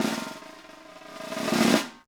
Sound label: Snare Drum Roll; lego the incredibles 2018 pc